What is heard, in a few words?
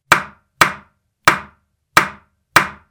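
hit sound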